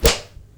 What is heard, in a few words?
slap hit; tf2